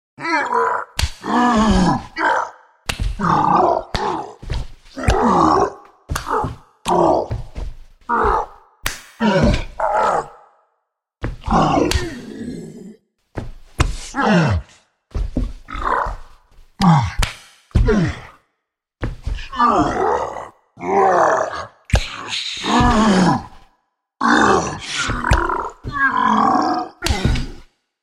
Two monsters wrestling and hitting each other; Fighting Ogres; Wrestling and Struggling

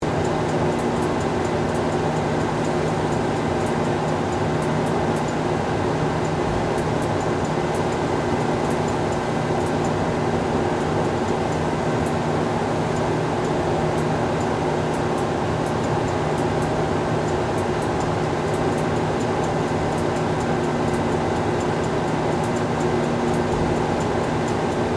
Factory Cooling, Industry, System, Loop; SoundEffects-BlastWave

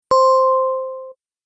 Tap Play, button; multimedia

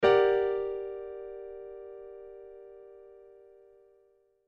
Piano Chord, Piano Chord G Min Maj 7Th; Pianos; Musical; Blastwave

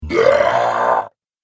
Scream Demon; Monster Sound Effects; horror sound effects

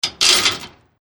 Chain Metal Clanging

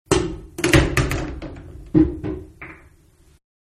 Billiards-Ball-One Entering Into Pocket; Pool; Billiards-Ball-One Entering Into Pocket Alternative; Manhattan Production Music

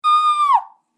Scream Human Female; Screaming Sound Effects

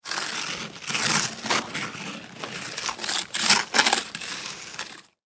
Weather Ice Snow Scrape Windshield

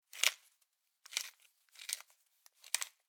Household Pepper Grinder Twist, kitchen

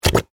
Texture, Bubble, Gurgle, Mud; Water Sound Effects; Nature

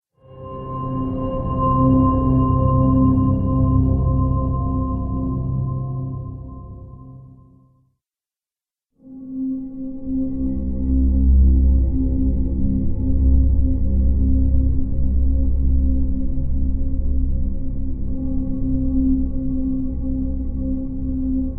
Epic Movie Production Atmospheres, drone; Drone Sound Effects

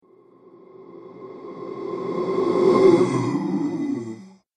Ghostly Halloween Monster

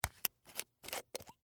Whiskey Bottle Cap, Unscrew, Off; Foley; Drink Mixing; BlastWave

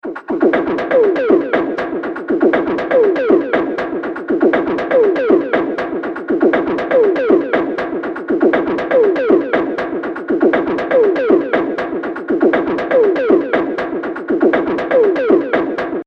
120 Bpm Beatbox Loop, Vocal Loops; Percussive And Rhythmic; Seamless loop

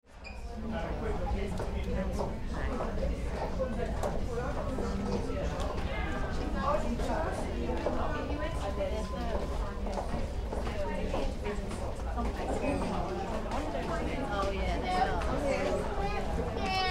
Department Store Atmosphere, voices and activity; voices and activity background - large department store; Stores And Mall; Tom Hutchings

